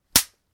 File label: body; 平手打ち２